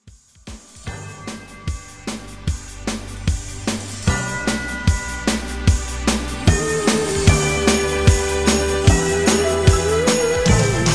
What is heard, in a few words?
Re-Drum 150